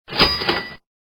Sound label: Cha Ching